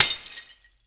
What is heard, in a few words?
Glass break